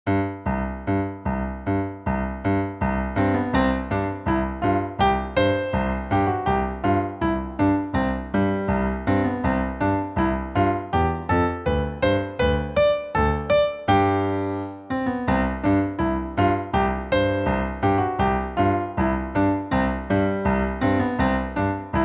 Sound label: Country Dance; Beethoven